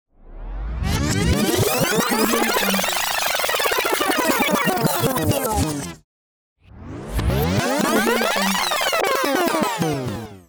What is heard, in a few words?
rewind transition sound